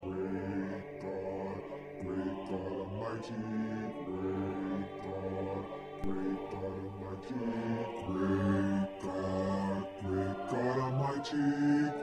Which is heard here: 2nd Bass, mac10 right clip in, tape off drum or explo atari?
2nd Bass